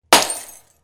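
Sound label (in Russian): закрытая банка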